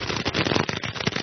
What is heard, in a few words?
animal